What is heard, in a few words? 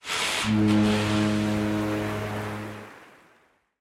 build warship; OpenFrontIO